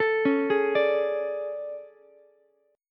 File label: A Major 11th, down Ukulele C6; AM11; arpeggiated; JGuitar